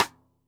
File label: xstick snares on; JazzFunkKit